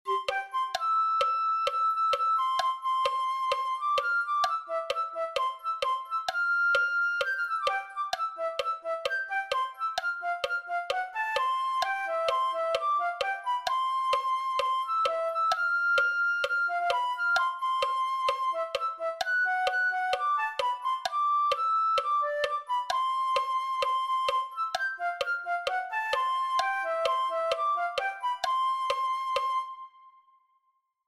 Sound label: flute